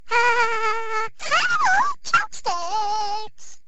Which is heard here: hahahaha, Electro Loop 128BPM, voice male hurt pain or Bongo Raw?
hahahaha